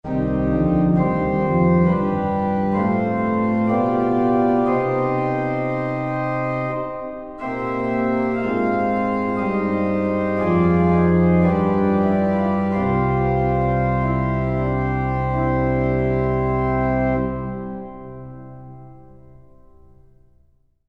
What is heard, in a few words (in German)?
prinzipale, orgel